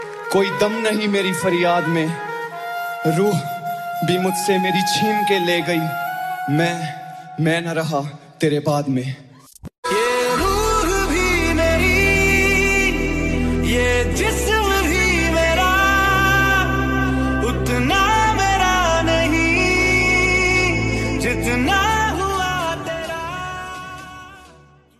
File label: bollywood music ringtone